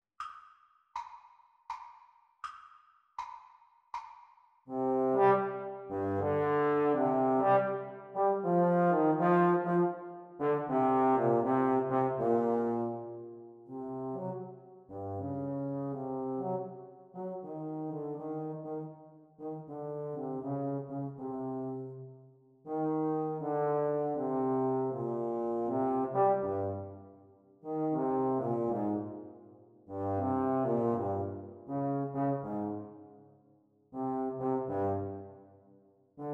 playalong for trombone practice speed

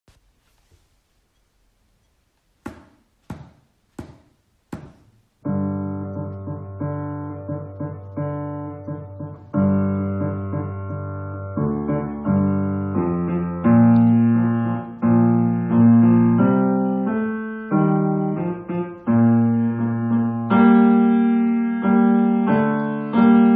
Piano Duet Pavane exam speed; Grade 6; teacher parts

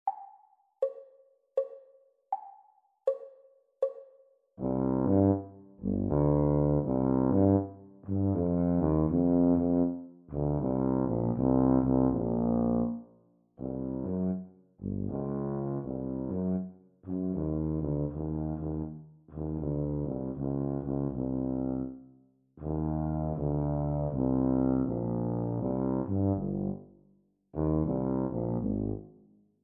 Bb Tuba Duet; Grade 6; practice speed

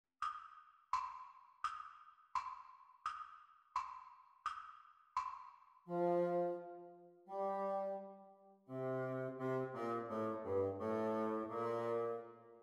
Bassoon Duets; exam speed

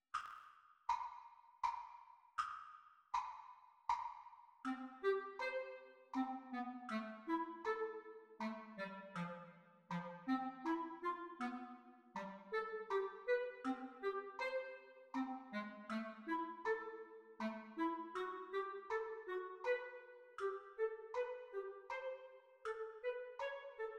Exam Speed Recording Clarinet Duets; Grade 8; The Teacher part

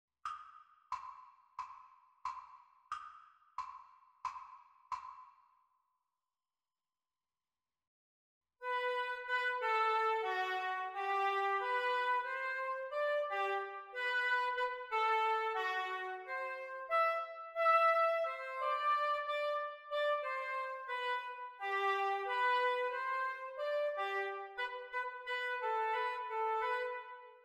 oboe duets practice

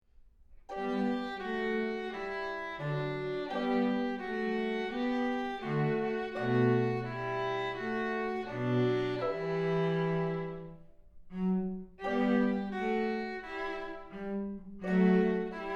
Down the Winding Stairs with violin

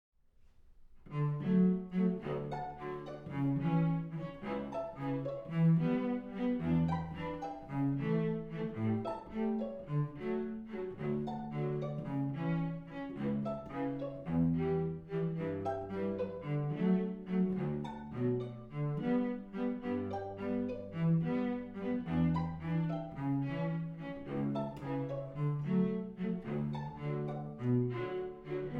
Without Violin

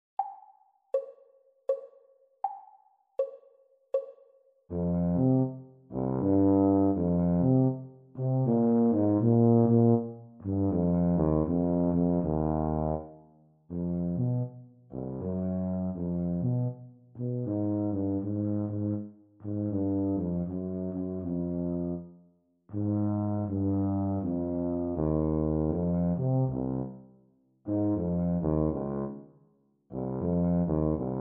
Eb Tuba Duet practice speed; Grade 6

